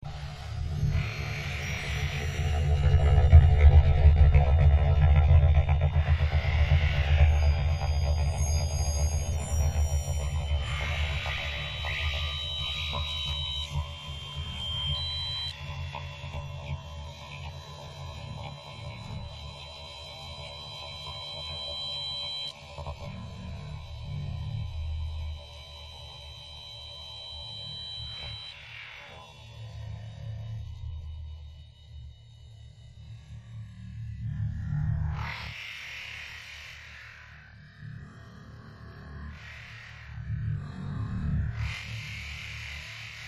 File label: amb